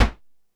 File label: Drum X Clap; Pearl Drum-X